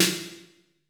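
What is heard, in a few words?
MIRROR Snare; Masterbits - TR-Minator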